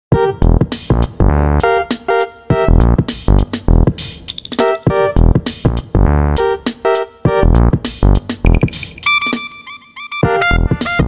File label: Trip Hop Nokia